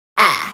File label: Bad Hit Sound; Beat Saber Miss Sounds